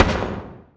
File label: asteroid damage carbonic medium; Factorio